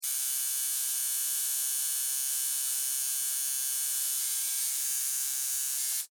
Industry Tattoo Needle Operate; Miscellaneous Electronic Sound Effects; SFX Bible 6

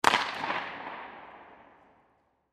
Hockey Slap Shot, Hockey Slap Shot Puck; Sports

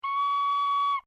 RECORDER LONG, cheap plastic school recorder; Miscellaneous Musical; Sound Effects:Musical

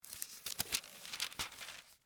Bible Turn Page, Book; Foley; BlastWave

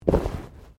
Body movement Fall Medium

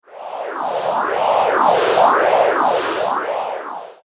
White Noise Loop - Whooshy; Loopable Sound Effect; Noise Sound Effects

